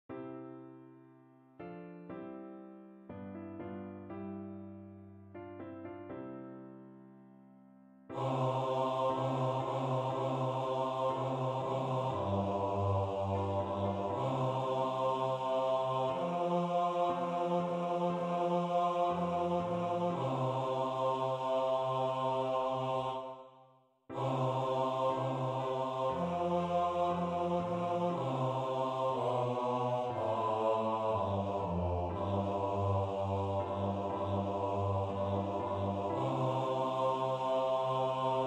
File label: Baritone & Bass; A prayer for Ukraine